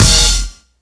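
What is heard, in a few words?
STACK BRASS, Ragga & Dub Reggae; RAGSTACKS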